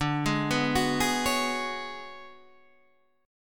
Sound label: B Minor 6th Add 9th D